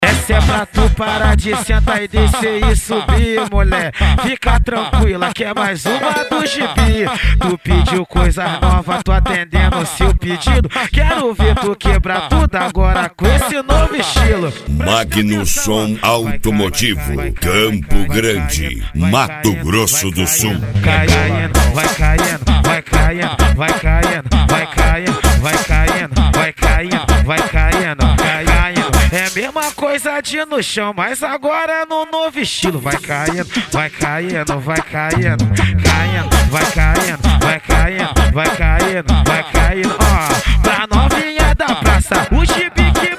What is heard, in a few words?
funk